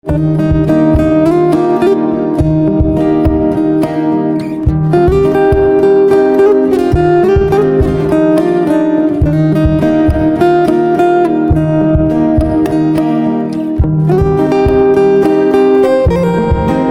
Guitar, instrumental ringtones

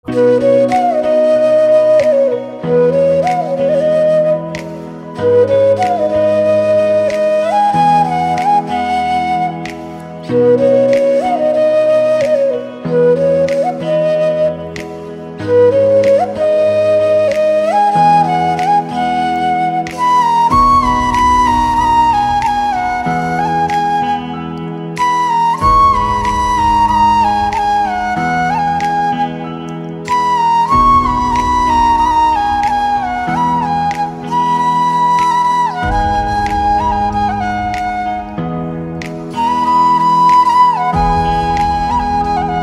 Flute

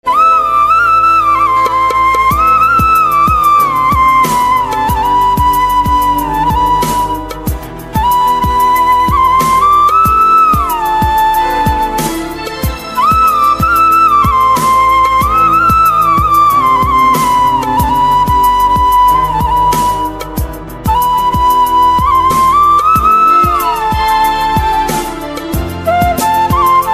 Flute

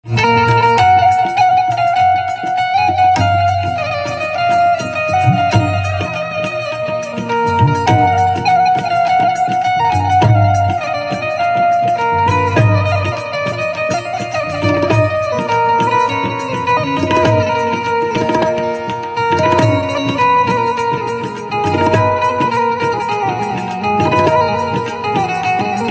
Banjo

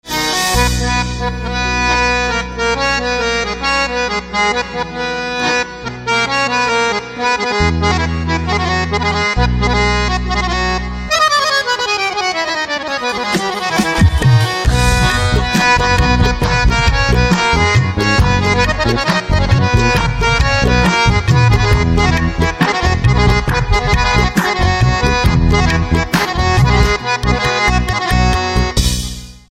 Harmonium

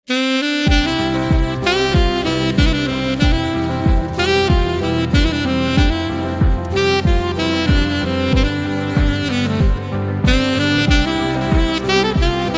Saxophone

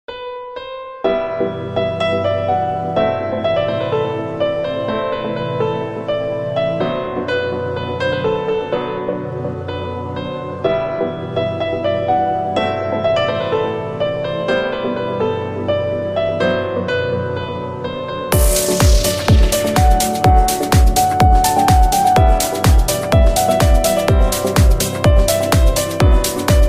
Piano

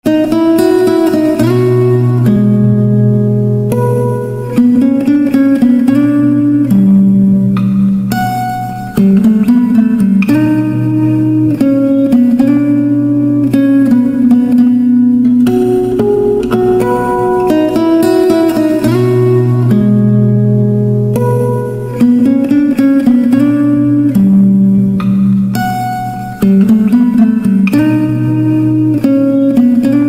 Guitar Slow

